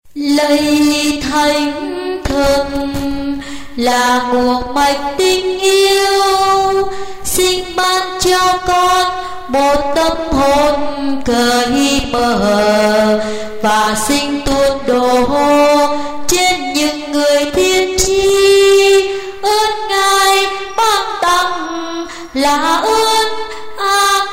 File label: alto basso